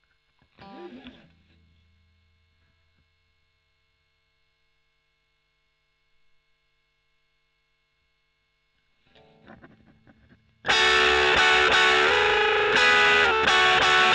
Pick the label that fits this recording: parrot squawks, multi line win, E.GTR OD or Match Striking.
E.GTR OD